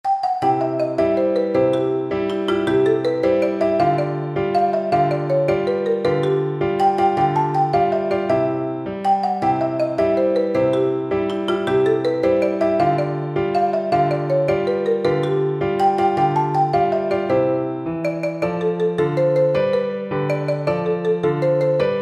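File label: marimba, percussion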